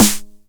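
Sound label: 909 SD Tape, snare drum; Goldbaby Tape808 & Tape909; The Tape 909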